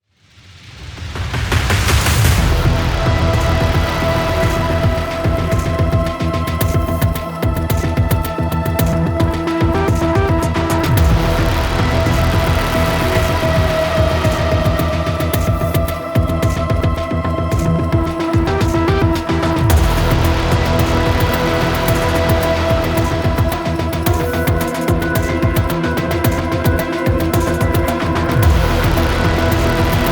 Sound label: Login Music; Login Screen; Corpus Lich